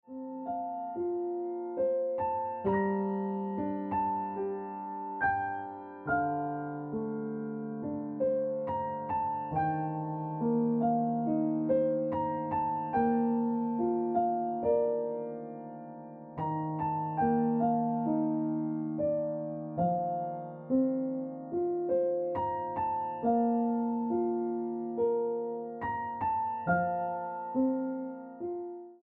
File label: Piano Music